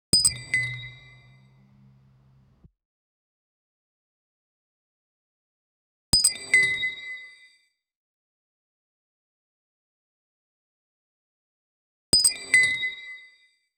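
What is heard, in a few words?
glass fall sound, breakage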